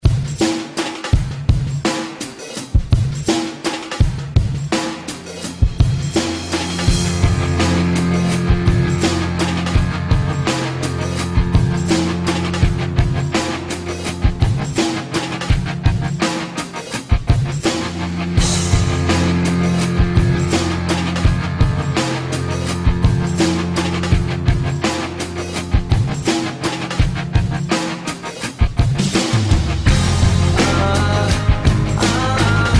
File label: Alternative Rock Re Drum 83 Bpm; DJDOLLS